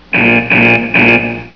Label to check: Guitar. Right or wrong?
Wrong